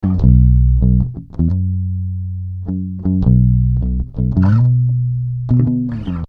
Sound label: bass A